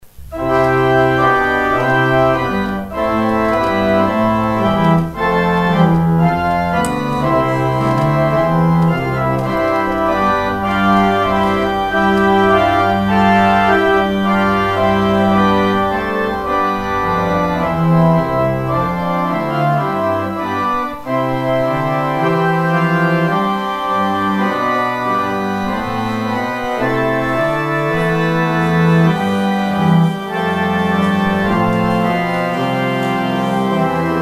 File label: organ outro; Organ exit music